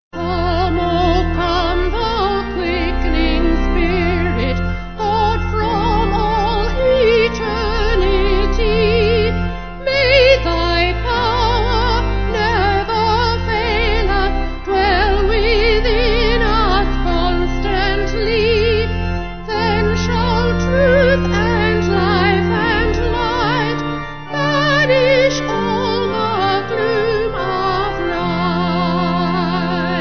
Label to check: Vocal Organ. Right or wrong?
Right